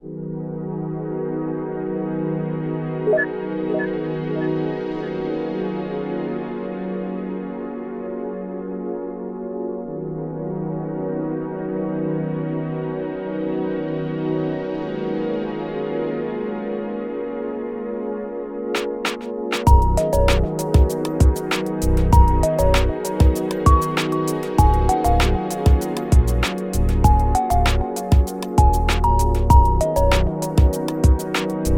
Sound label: bg music